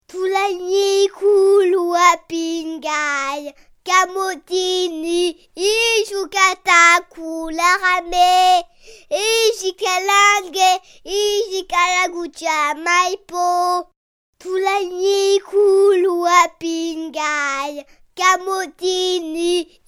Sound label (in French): chante en inuit; nouvelles sonores